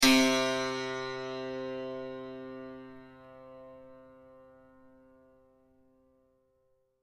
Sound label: santor mi